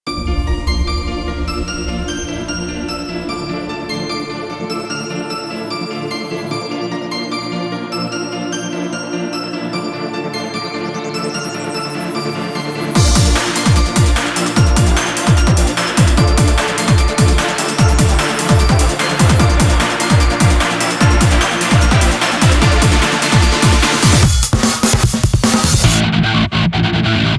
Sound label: Instrumental